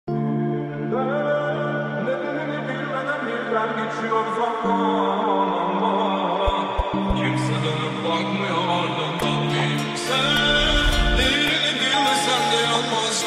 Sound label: Azeri Bass Music Remix